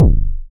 kick single hit c key, Royality free steel kick drum sample tuned to the C note.; single hits one shots